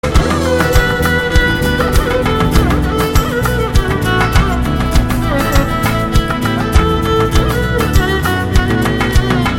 violin music Solo

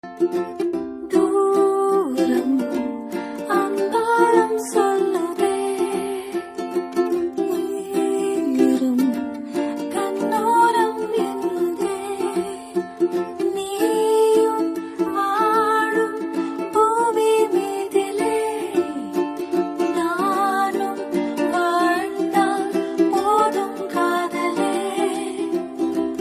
Ringtone

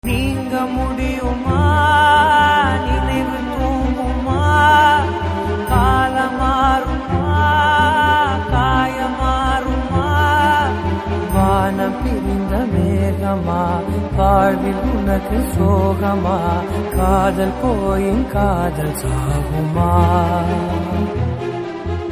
Tamil Ringtone; Psycho

